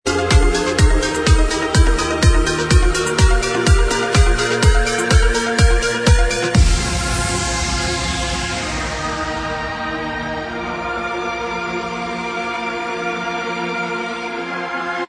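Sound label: remix instrumental track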